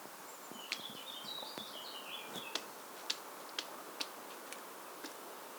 Eurasian Red Squirrel Sciurus vulgaris zachte contactgeluiden